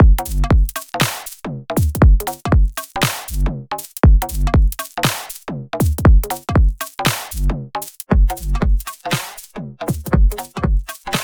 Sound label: Electronic Drums; Vague